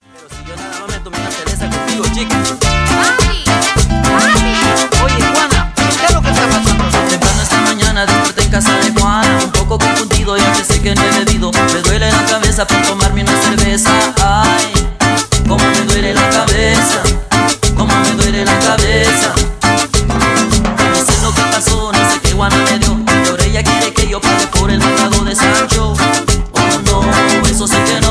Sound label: Cumbia Regional